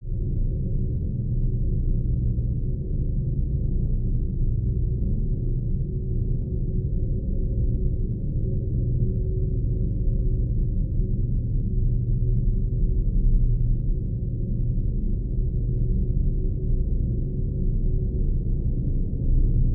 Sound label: Ambiance Low Bass; drones & tones